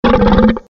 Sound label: danger growl